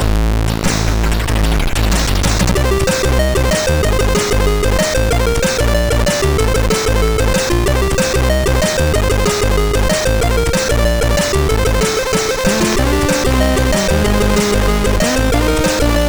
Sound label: Meaning, MOS6581R3